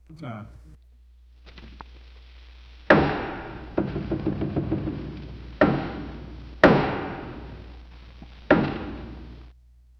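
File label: fast powerful hammer strike; tool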